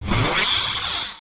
speed up

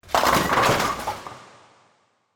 bowling pin

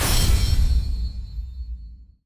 metal logo

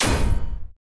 machine hit

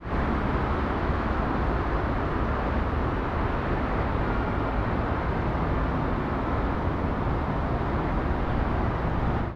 big city sky

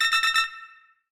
warning, button

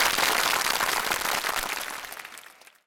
clapping hands

